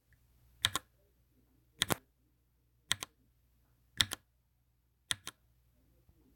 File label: no ring